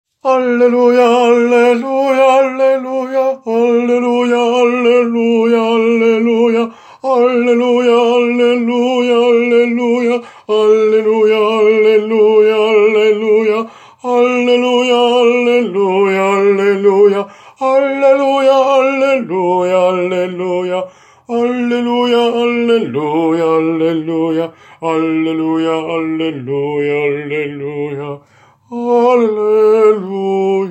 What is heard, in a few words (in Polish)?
TENOR; Alleluja Young